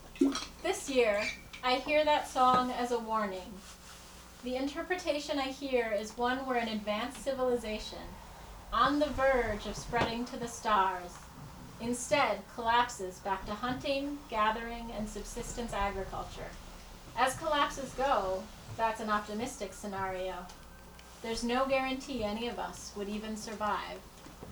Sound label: outro uplift